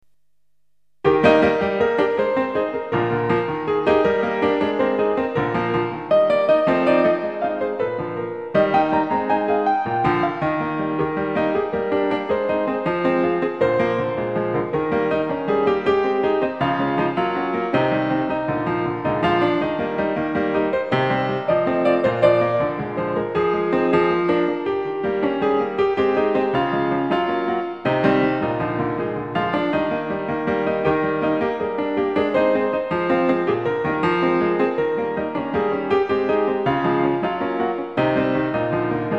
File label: piano